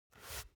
sport basketball turn swipe short quick

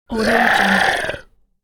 fierce dragon

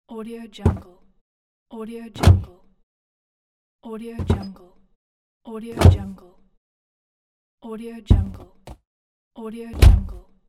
car door

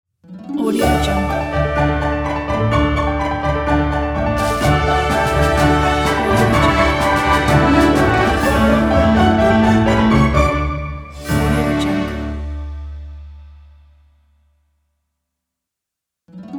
orchestral logo

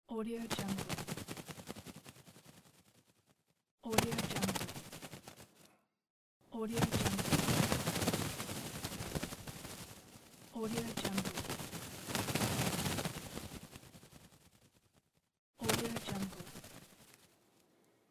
birds flying off flapping wings; luishpms

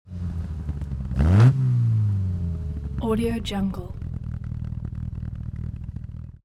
transportation car opel kadett deceleration idle

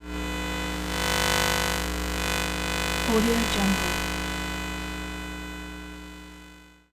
lost transmissions tonal passbys; soundmorph

